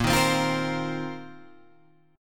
A sharp Suspended 2nd Suspended 4th down Guitar Open G